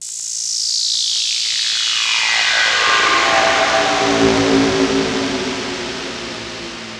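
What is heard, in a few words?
SYN right, Production Effects; Best Service - XXL Production Effects; SYN-FX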